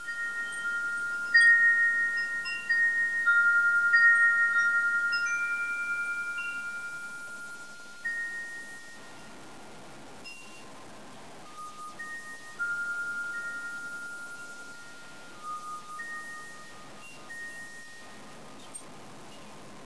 pago chimes

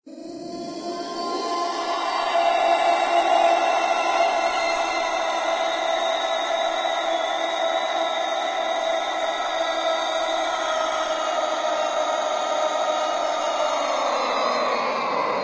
intro sfx; reddeath

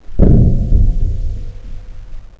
player swim

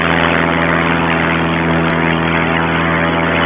seaplane

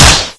shoot, weapons

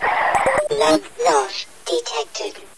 zombie alert

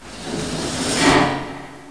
water on, ambience; caged

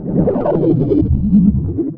weird sound

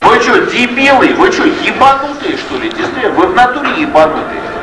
casino

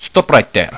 attack

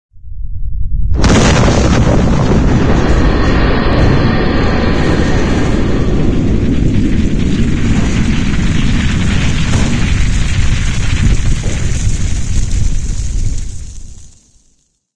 depart rocket

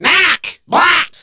ufo run over

